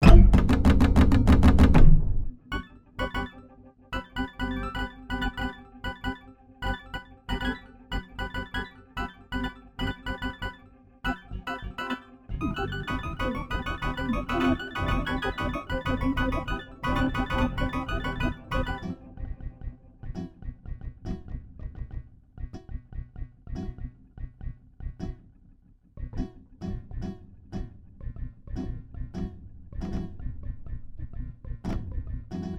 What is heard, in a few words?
hit some rhythm, electronics